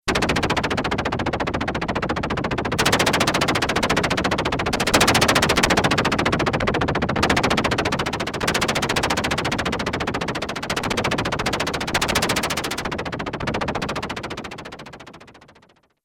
ELECTRONIC MACHINERY, Korg DW-8000; Factory Patches

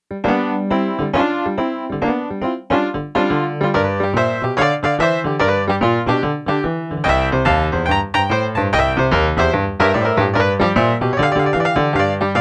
Piano Brite, Yamaha TX802; Factory Patches; Voices

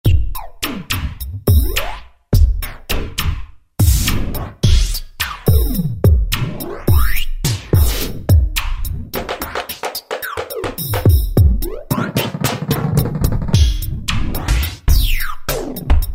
UFO Drums, Alesis QS8; Factory Preset examples

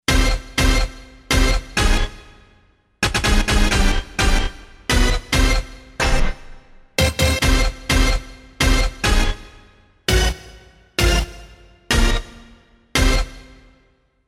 DOUBLE HIT, Roland SC-880; Factory Patches

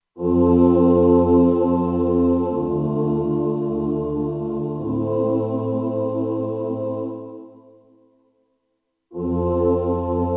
Cathedral Choir, Kurzweil K2500X; Factory Programs

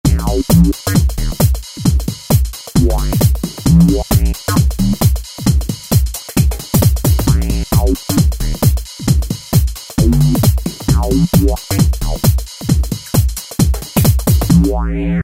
Tech Garage, Korg ES-1; Factory Patterns

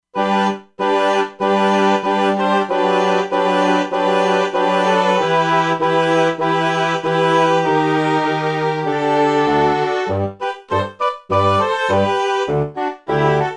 E-mu Proteus-2; Factory Presets; Divertimento

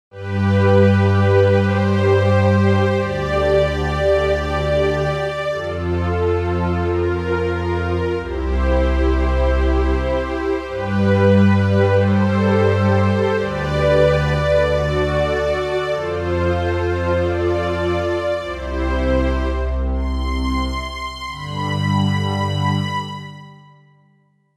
String Synth, Roland JV-880; Factory Patches

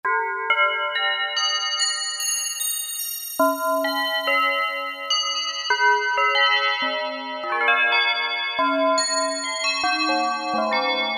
Kawai K1m factory patch Bellery; Factory patches - I banks

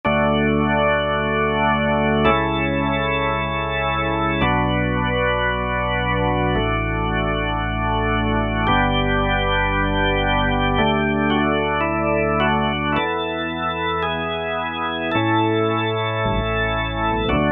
Full Slow, Organ

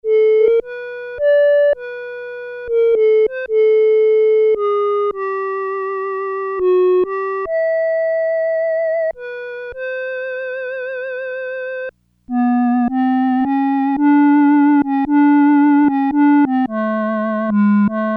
Reed - Clarinet, Crumar Trilogy

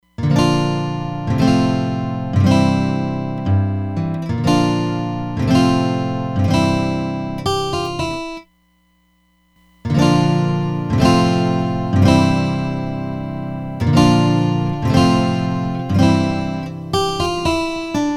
Chorus & Panning Delay, Roland DEP-5; Factory Patches

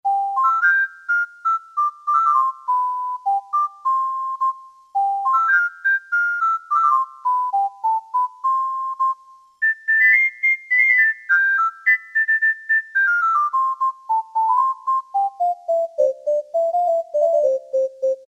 FLUTE, Casio Rapman; Tones

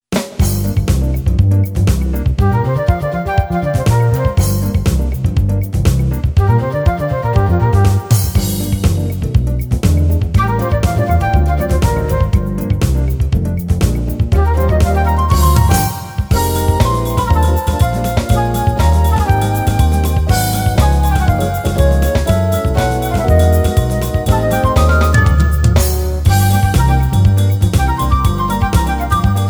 Sweet Flute, Yamaha PSR-550; Factory demos

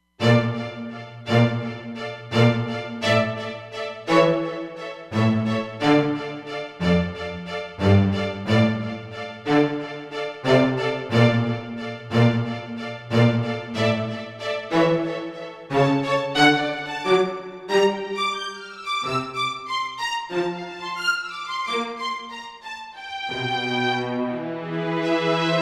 Staccato, Roland Fantom XR

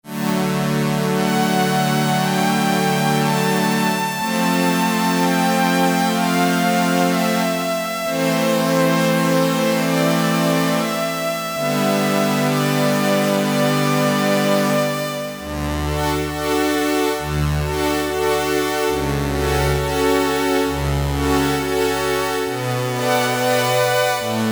Strings, Casio MT-600; Tones

